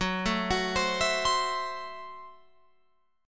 F sharp Minor 7th Flat 5th F sharp Guitar Open F; arpeggiated; 18,16,18,19,19,19

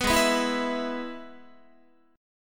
Ab Minor Sharp 5th chord, Guitar; Abm#5 strummed; JGuitar

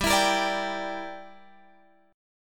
G Minor 6th, Guitar Drop D

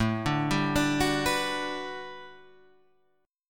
A 13th Suspended 4th A, guitar chord; arpeggiated

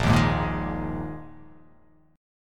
A sharp 7th Suspended 4th down

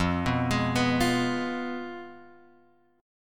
F Diminished; Fdim Chord {1 2 X 1 0 1} arpeggiated

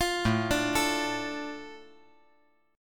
A Suspended 4th Sharp 5th, A

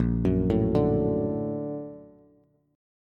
Bb Augmented Major 7th Bb, Bass; Bb+M7 arpeggiated; JGuitar

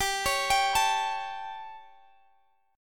A 7th G

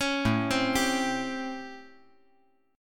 A 7th Sharp 9th; 6,9,8,10

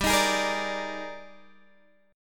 Eb Major 11th G

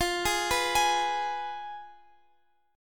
F Diminished Guitar Open F; arpeggiated

